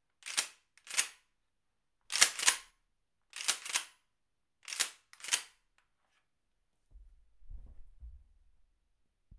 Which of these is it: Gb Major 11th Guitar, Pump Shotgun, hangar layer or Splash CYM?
Pump Shotgun